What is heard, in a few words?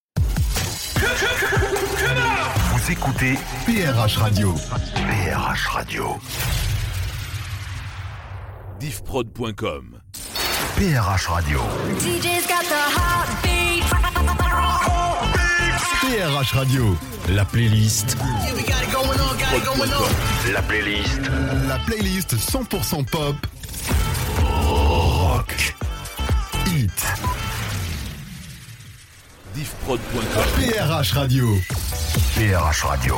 Radio Jingles CHR